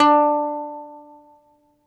BALALAIKA, Prima Balalaika, Plucked Strings

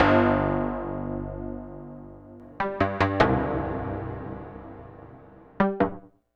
Kling Klong, Synths; Sonic Foundry (Sony Creative Software); Modular Electronica

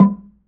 MID LOG DRUM; AKAI S-Series CD-ROM Sound Library VOL-5

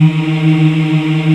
VOX AFMM, VOX Afro Mms, Africa; Roland LCDP11 Africa VOL-1

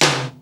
SNR; 90 sSampleCDs; Crypton - DrumBase5000; GO GO DRUMS

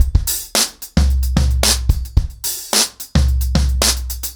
110BPM, acoustic drums, drumloops

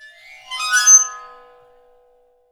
waterphone

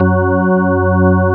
GROOVY F#3, GROOVY ORGAN

